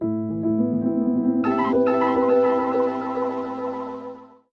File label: PHRASE - 106 BPM A Min, Ear Candy; Monday; Cymatics; GHOST (Beta Pack)